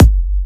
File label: Bassdrum; Mutekki Media; Vengeance Electroshock Vol.2